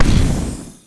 fire bomb

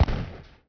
Gun Fire; shadez

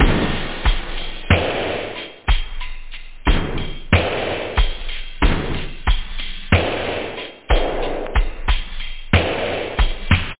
mindblow, loop house; da capo vol1

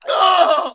player death; Daikatana

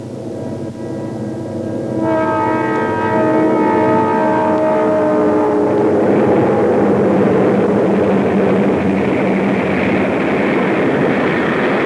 gas, Transpor; Sound Library 2000; Wayzata Technology

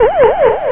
ufo, electronic sounds; Sound Effects for Multimedia - Volume 1

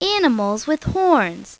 Horns; My Science Fair

